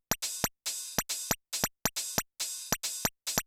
GLASS D, Techno 138 BPM; Best Service ProSamples vol.54; AKAI; FALLING HI

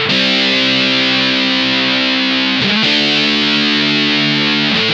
BIG CHORD, Funk Guitar; Zero G - Funk Guitar

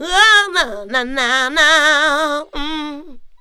WOH WOH, Funky Vocals; USB Soundscan vol.18

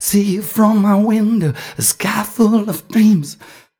m 128 mel b-flat, Vocals

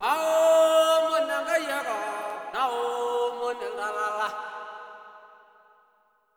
SOLO G#, MALE CALLS; Spectrasonics; Heart of Africa 2

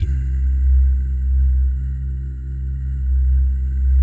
BS DOO, Vocal Planet Jazz & FX; ILIO; JAZZCOMBO

